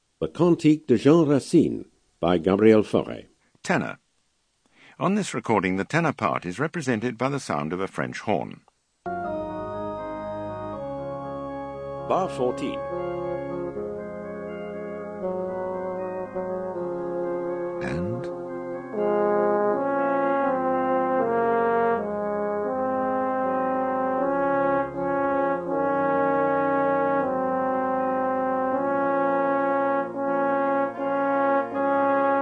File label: Ten Bass; Faure Cantique de JR